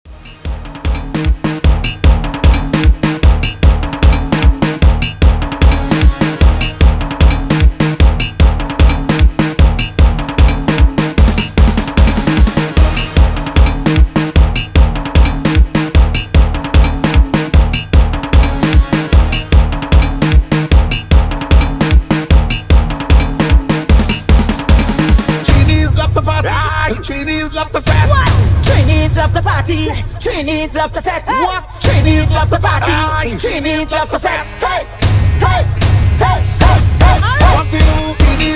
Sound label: hype intro clean